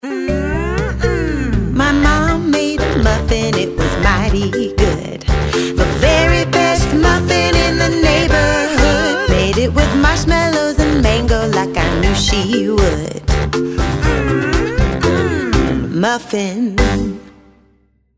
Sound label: M Song Muffin; kindergarten grade 1 2 letter sound